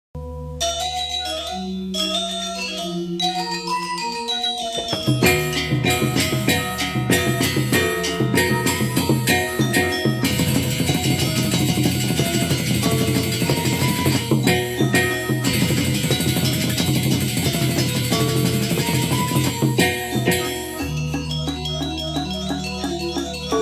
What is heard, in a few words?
Gamelan in Peliatan Bali Indonesia